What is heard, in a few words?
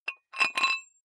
dumbbell thread take off weight soft, fitnessstudio